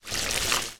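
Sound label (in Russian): короткий приятный звук морского прибоя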